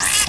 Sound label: houndeye hunt; gearbox